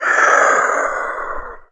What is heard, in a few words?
scrm